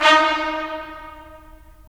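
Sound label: brass trumpets staccato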